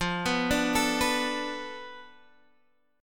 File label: B Minor 7th Flat 5th F, guitar chord